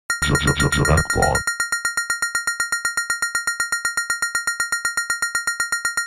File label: jackpot tune, slotmachine; gmod; pcasino; basic slot machine